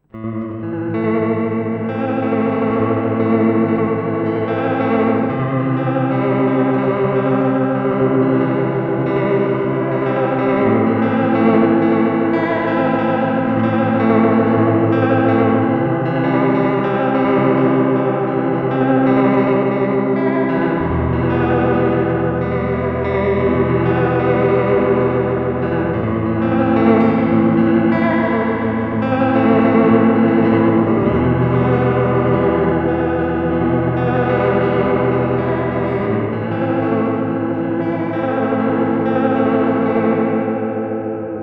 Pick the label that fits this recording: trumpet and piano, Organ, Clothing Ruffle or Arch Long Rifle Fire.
Organ